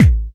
BD; Best Service; Dance Mega Drums

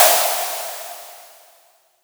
V noise, G3; 300 Drum Machines

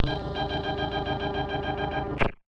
Casio, Glitched, malfunctioning synth

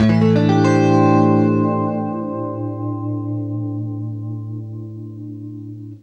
CLEAN AM9, Guitar; East West; Steve Stevens Guitar Samples Collection

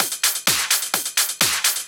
Clubhouse Loop 128 BPM

